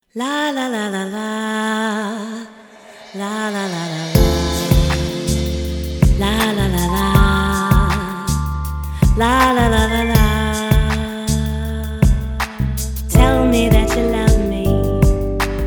soul vocals

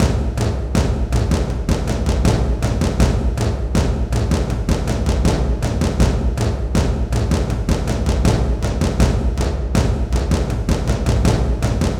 tom loop 160 bpm

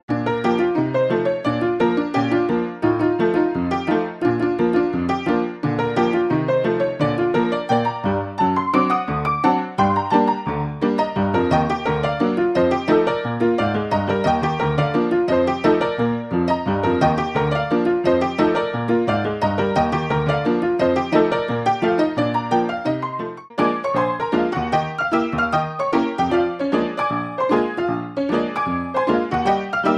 tack piano

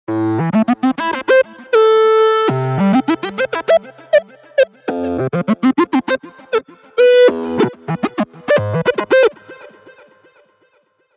lead

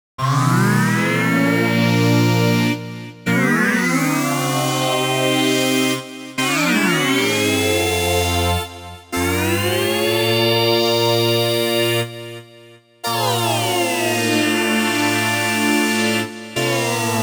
pitch me lead

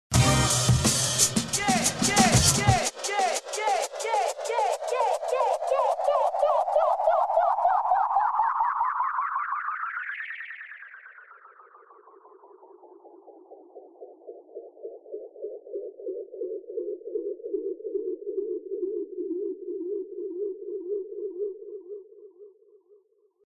overdub accel mod

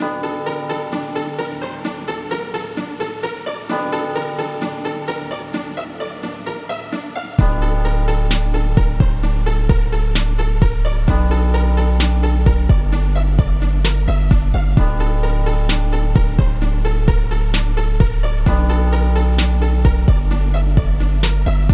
helium trap loop

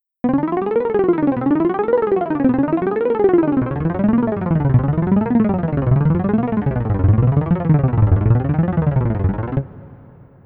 fx repeat sequence

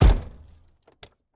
stomp; nova sound

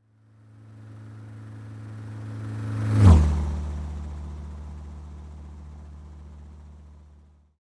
car right to left

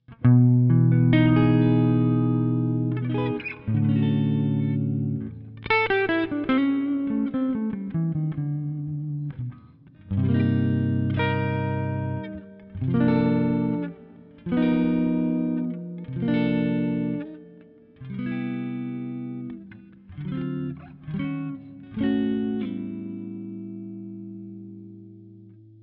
gibson clean

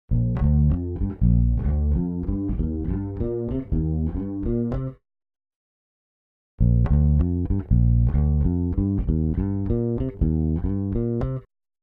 h910 harmonizer; eventide

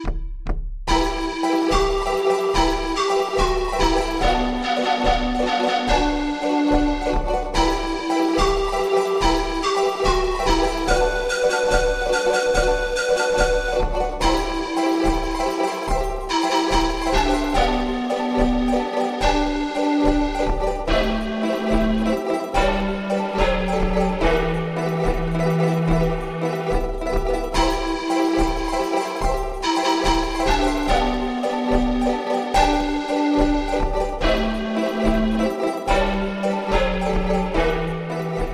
andean siku south america

